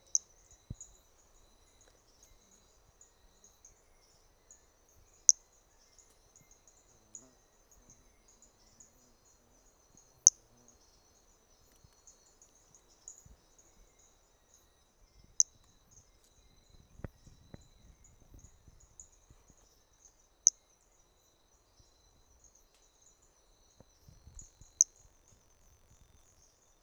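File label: Brachycephalus hermogenesi three starting notes; Trilha do Ipiranga; three starting notes lost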